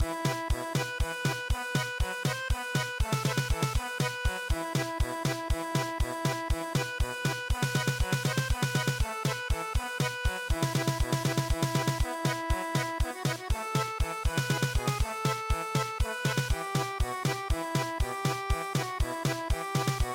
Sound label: Garba German Polka